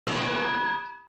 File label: clank sound